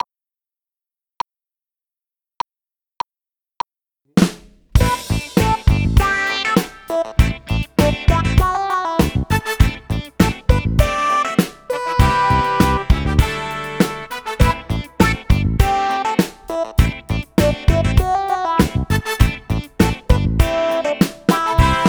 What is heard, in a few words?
Keys Version